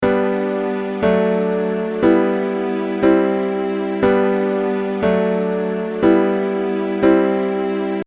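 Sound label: I-V-vi-IV chord progression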